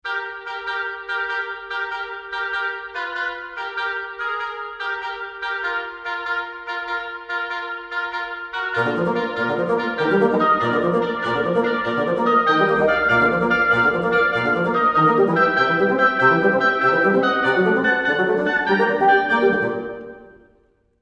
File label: Woodwinds; Berlin Woodwinds; Orchestral Tools; From Symphonie Fantastique: “Songe d’une nuit du sabbat” by Hector Berlioz (1830).